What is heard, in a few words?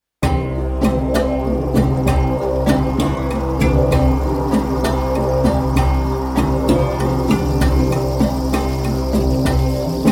World Music Ambient